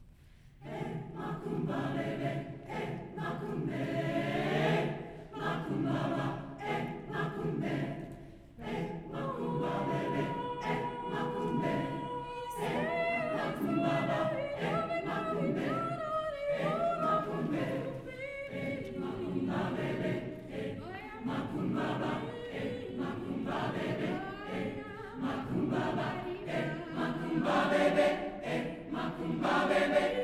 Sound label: University Choir